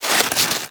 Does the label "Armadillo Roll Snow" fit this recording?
Yes